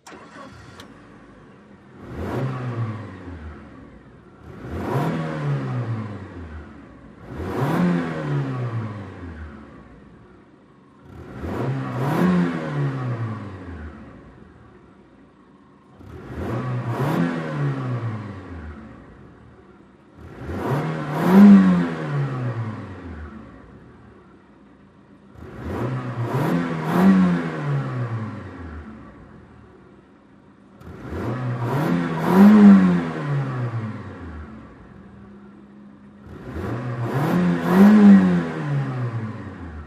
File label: Sm Car Eng Idle Off, compact